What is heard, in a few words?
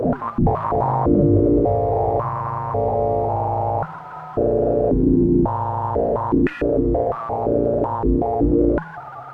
Bb noise